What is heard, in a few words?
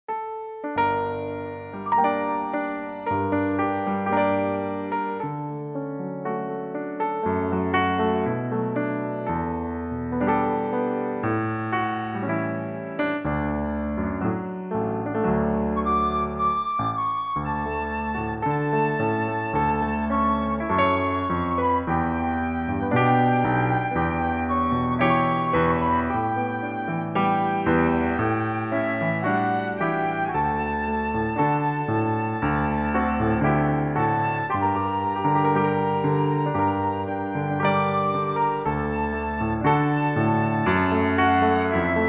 play flute reference